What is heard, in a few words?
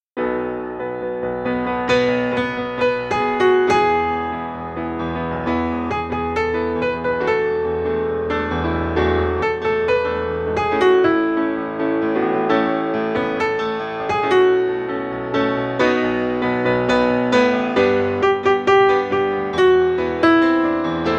FOLK Melody